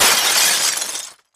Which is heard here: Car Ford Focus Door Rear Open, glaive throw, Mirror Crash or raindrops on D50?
Mirror Crash